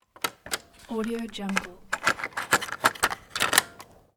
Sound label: cassette recorder insert tape